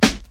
classic natural hip hop snare d sharp key, Royality free steel snare drum tuned to the D# no